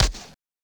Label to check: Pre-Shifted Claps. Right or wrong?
Wrong